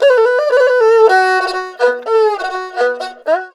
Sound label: ERHU LICKS